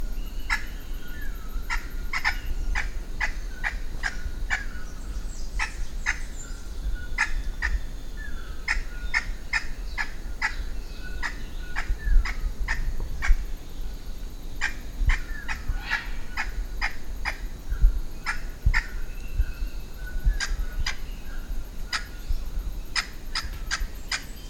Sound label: Red necked aracari